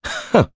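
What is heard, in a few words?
Laugh, Japanese; voices; heroes; Mitra; kingsraid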